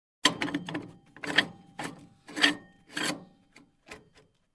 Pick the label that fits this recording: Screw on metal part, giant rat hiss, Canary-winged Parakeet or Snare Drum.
Screw on metal part